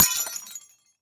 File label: smash